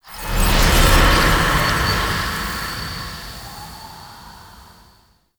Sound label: Transition Stinger In; Magulis; Warframe; Tau